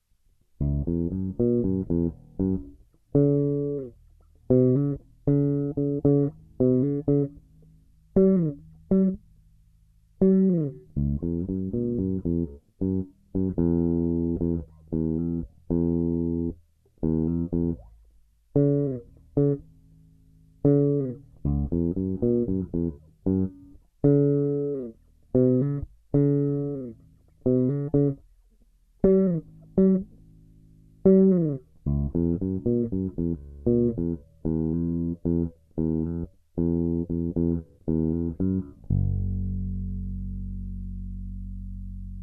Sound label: Jazz Bass without Drums Treble Down